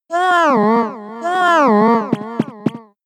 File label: Yawn; pokemon sfx gen 7 attack moves sumo usum